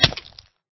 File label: hurt flesh, damage; eaglercraft-1.5